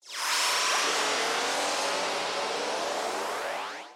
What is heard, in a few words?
beam gun charge